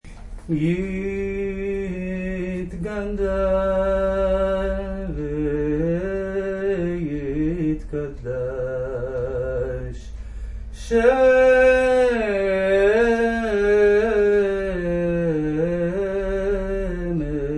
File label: Kaddish Nahwend